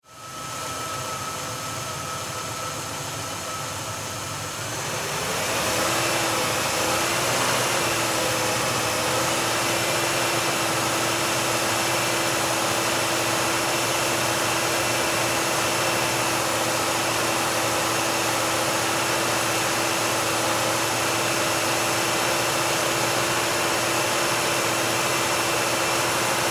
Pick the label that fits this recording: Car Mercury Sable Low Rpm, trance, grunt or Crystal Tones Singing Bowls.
Car Mercury Sable Low Rpm